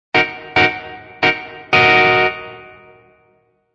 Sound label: Jazz Electric Guitar Loop End D#m 180 bpm; Jazz electric guitar loop in D# minor at 180 bpm; Guitar Loops